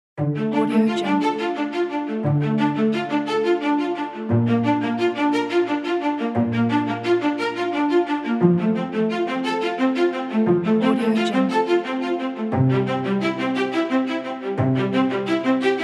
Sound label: strings